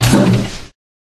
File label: IceBear Block